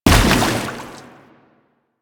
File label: Step Water, Footsteps